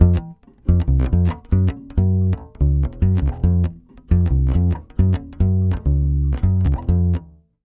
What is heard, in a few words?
140 BASS F7, Brazil Bossa; USB Soundscan; AKAI